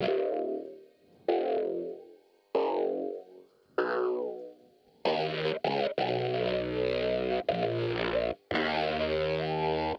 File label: Bass Chorus